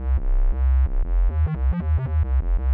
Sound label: fat sine bassline; 'Effection' samples; lolicore